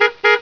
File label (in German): hupen